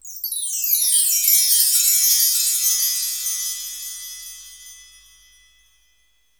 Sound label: Wind Chime; Sonic Foundry (Sony Creative Software); Cinematic Funk Urban Tone Poems